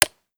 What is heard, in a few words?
taurus shell in; daffas arsenal; tacz